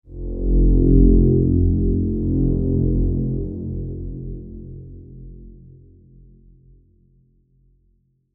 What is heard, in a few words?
Low Deep Slow Wobbling; Scary Soundscape Sound Effects; Scary Soundscapes (Sound Effects:Horror)